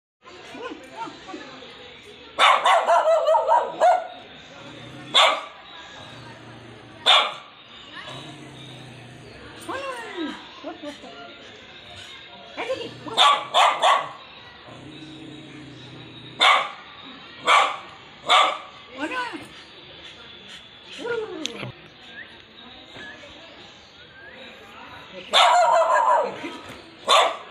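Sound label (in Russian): белый шпиц громко лает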